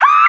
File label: scream